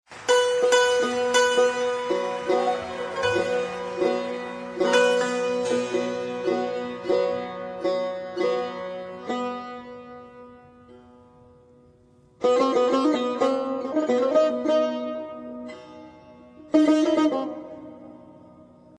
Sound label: Tar & Avaz; Sonnati